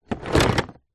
leisure toy train wooden track pieces in plastic box movement